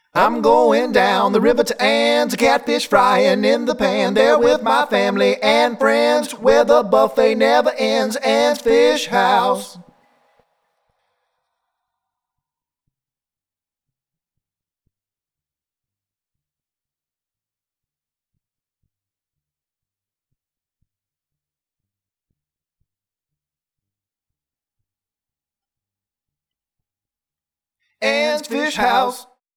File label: JINGLE vocal; WKXY; ANNS FISH HOUSE